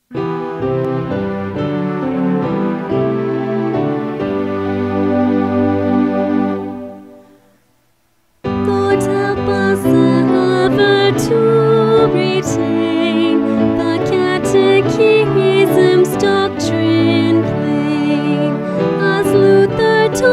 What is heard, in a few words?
choir